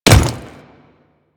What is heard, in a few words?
Default Step, Footsteps; Royal Guard